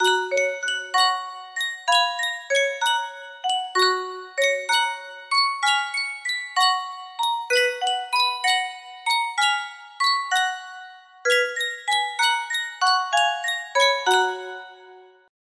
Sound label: Sankyo music box